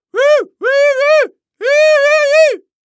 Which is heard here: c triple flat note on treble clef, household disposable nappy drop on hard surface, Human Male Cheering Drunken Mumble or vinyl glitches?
Human Male Cheering Drunken Mumble